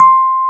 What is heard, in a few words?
electric pianos, C 2; Wizoo